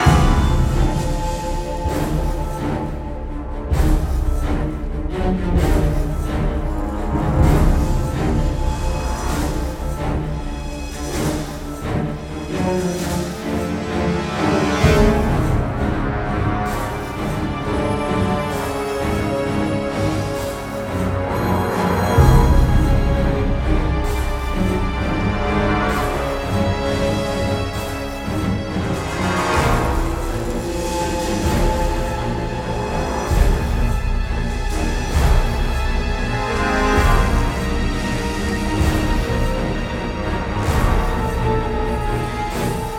Action FULL Loop 130BPM, Classic Horror Sci-Fi Music; Dead Ark